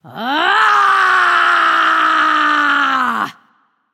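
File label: battlecry, female; smite; voicepack; hera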